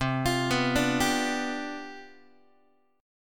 C Major 7th Suspended 2nd Suspended 4th, C, down, Guitar; arpeggiated; CM7sus2sus4; x,8,8,9,6,6